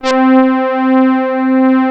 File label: SAW LEAD; PPG; analog; USB Soundscan vol.49; Analog Fantasies; AKAI